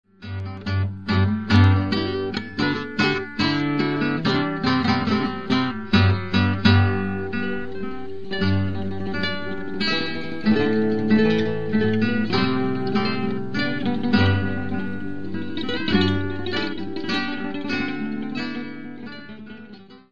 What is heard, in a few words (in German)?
schneefloeckchen hook